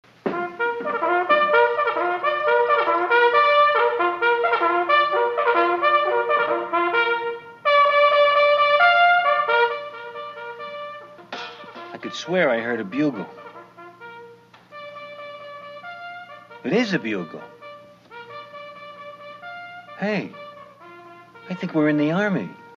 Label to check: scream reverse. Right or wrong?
Wrong